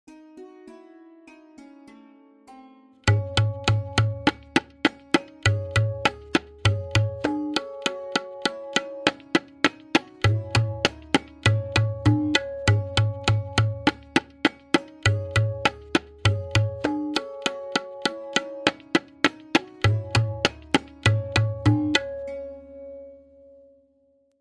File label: Variation 6-Played with Lehra, Benares Kaida 1, Tintal; Benares Kayda 1